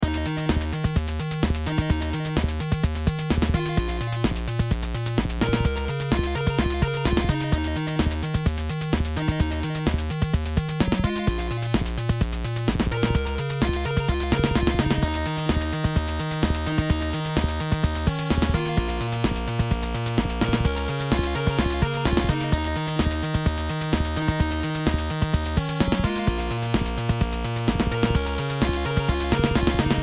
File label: beat